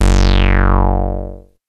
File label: Ultimate Bass Root F#; Vengeance Samples